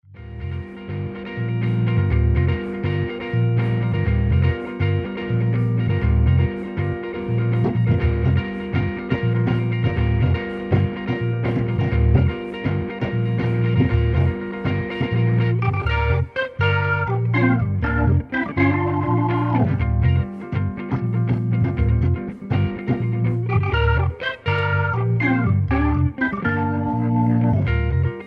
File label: Pot Licker w/o drums